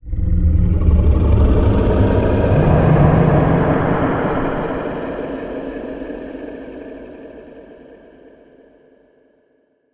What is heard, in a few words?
Low Roar Distant, Monster Sounds; TheDeclineOfWarriors; Monster Sounds Volume II